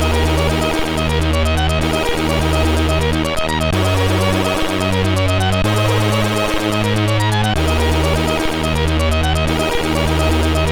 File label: Intromusic, sid CSG8580R5; HVSC